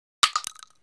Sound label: 12cal shell concrete, shotgun; bullets